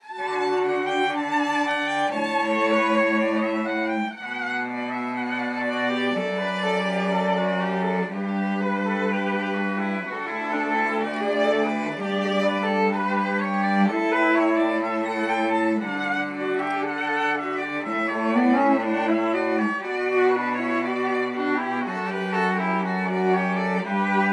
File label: String Trio; Canon in D